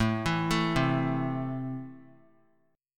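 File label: A Minor, chord strum; JGuitar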